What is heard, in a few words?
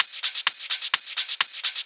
Clubhouse Loop 128 BPM